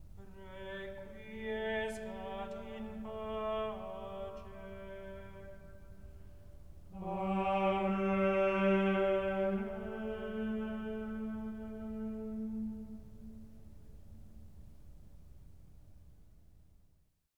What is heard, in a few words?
Requiem Missa pro defunctis Gregorian; Postcommunio; Gregorian chant